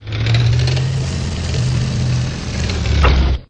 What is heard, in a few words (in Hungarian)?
tank fordulas; Pure Pinball